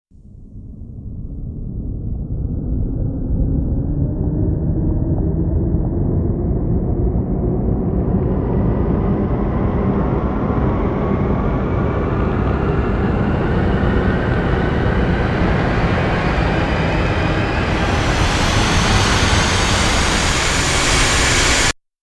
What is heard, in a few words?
Rise Coaster; Brand X Music; Brand X Music Catalogue - Editor's Essentials